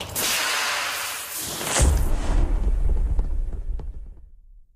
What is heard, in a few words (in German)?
waffen raketenwerfer; minecraft